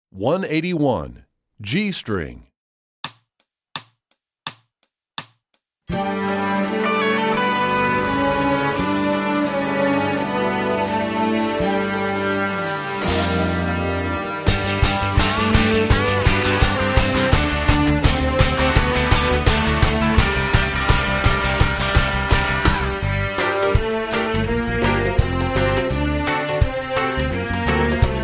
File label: G String, Strings; EE2000 Book2